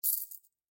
Chain Foley; SoundsCrate

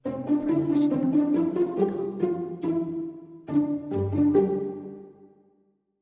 Friendly Ident; SoundsCrate

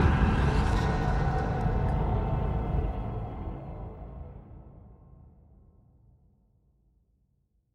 sci fi impacts

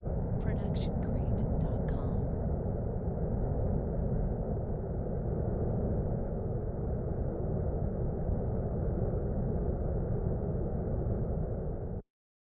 avalanched wind

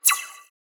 scifi weapon blaster accent

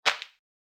footfall outdoor step; soundscrate

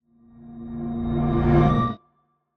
spooky riser; soundscrate

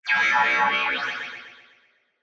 anime bouncing

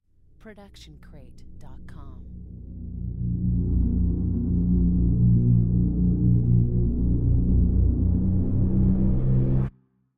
foreshadowing rise; soundscrate

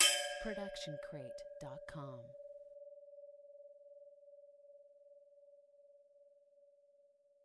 Metal Hit; soundscrate

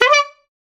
Bycicle Horn; SoundsCrate

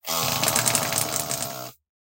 Fart Noise; SoundsCrate

